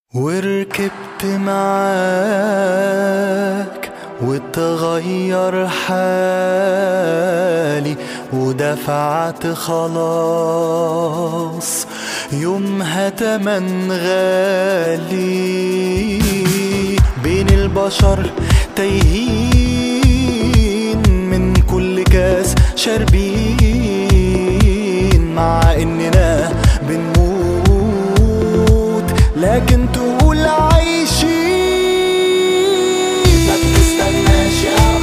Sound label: Ringtone